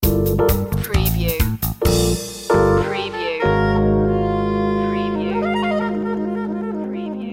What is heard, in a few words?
celebrate sting